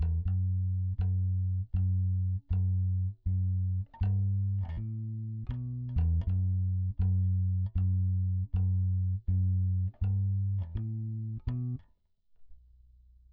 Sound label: bass